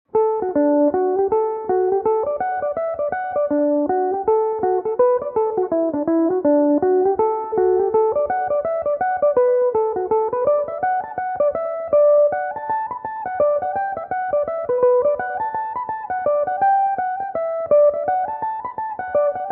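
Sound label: D major, mandolin; melodious foot of the glen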